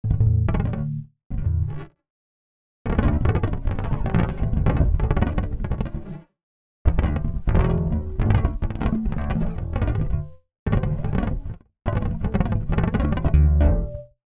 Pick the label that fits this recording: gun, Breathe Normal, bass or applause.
bass